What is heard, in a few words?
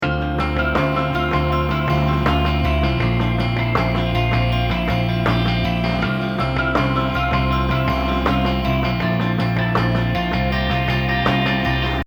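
lo fi drum; otoasobi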